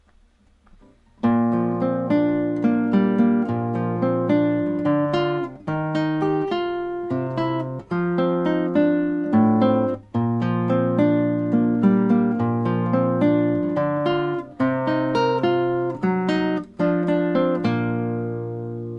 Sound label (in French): guitare intime